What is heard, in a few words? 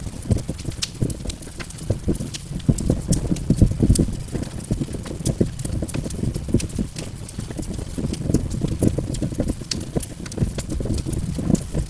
fire